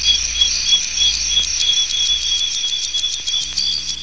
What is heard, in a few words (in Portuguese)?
RASPANDO